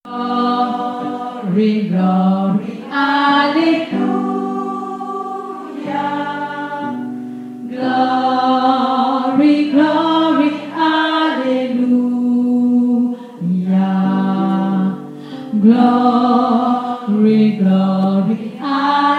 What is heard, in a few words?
mezzo